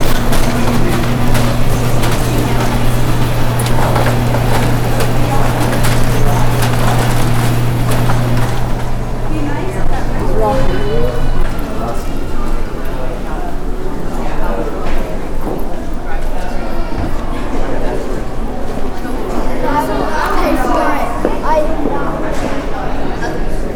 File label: mall main promenade